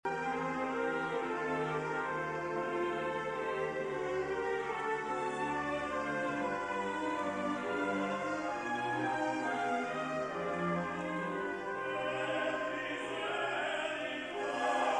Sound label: symphonic choir and orchestra; kiev; ukraine